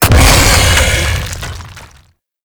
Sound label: Infested Bone Blade Tentacle Spike Impact; Warframe